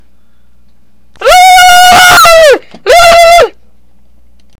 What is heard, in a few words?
war cry; Brickshelf